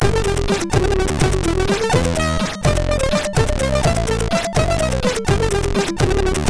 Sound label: sid MOS6581R3